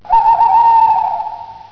eule

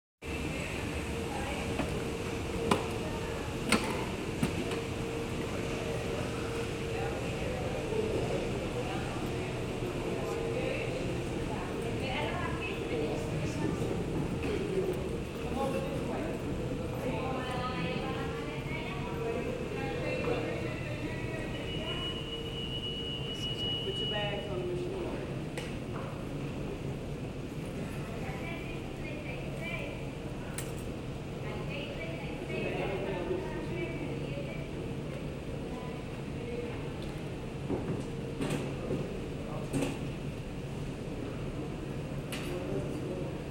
sicherheitszone, Am Flughafen

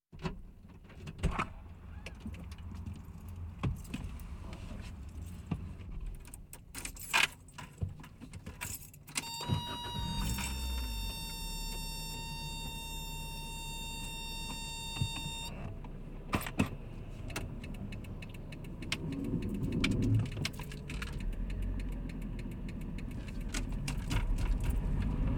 geräuschkulisse beim autofahren; Auto-Zweiraeder

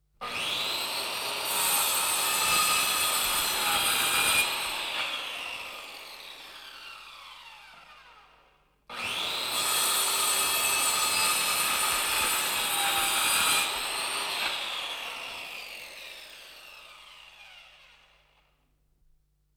kreissäge; Alltagsgeraeusche

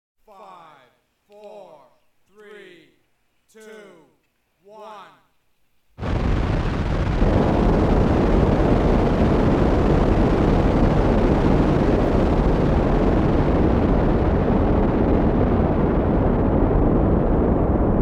ufos raketenstart mit countdown; Science Fiction

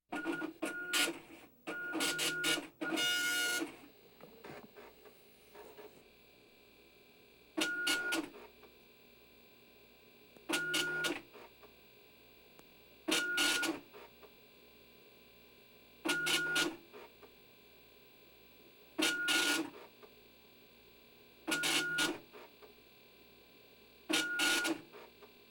nadeldrucker; Alltagsgeraeusche

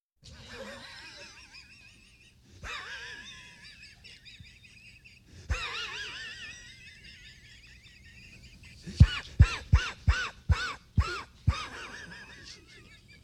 anschwellendes lachen

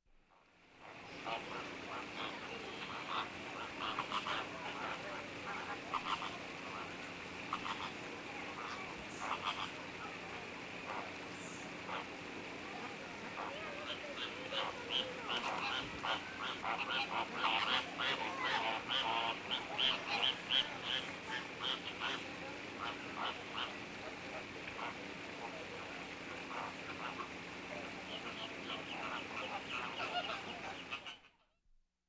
enten mit jungen; Auf dem Land

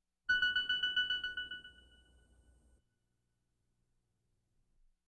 verklingender echoton; Science Fiction

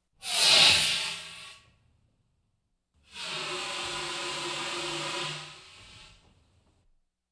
elefant trinkt wasser; Urwald

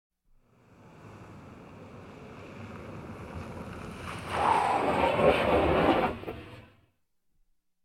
abrupt abbremsendes auto; Auto-Zweiraeder

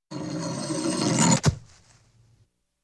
Guillotine hackt Kopf ab; Horrorgeraeusche

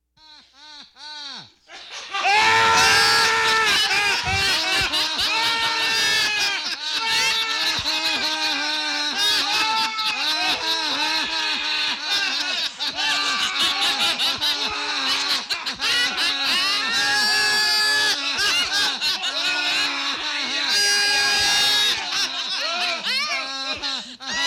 lachen

